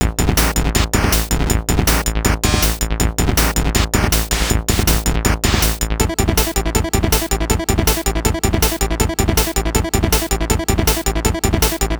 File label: loop 80